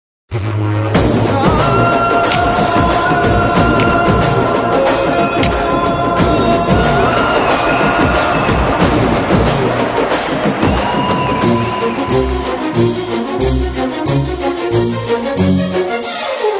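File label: Teaser background music; Eeswaran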